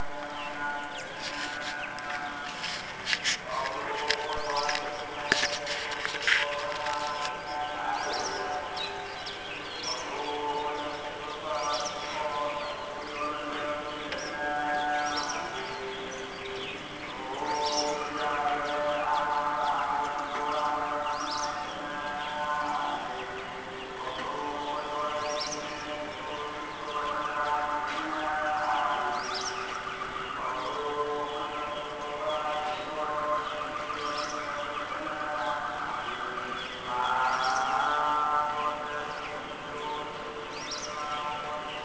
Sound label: chanting; addis